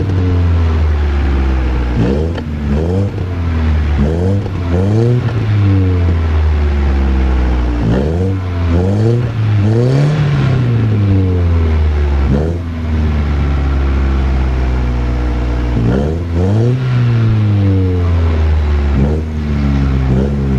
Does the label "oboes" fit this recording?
No